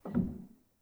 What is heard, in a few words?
rel, Grand